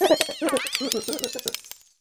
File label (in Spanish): Grito de Gholdengo